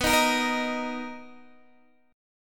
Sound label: B Major 7th B down Guitar DADGAD